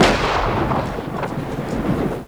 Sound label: thunder; Goonies; Adium